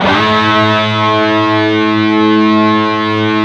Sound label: PRS, Guitars, PWR SLID